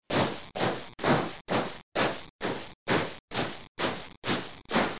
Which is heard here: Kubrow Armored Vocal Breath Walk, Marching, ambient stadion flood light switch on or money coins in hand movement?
Marching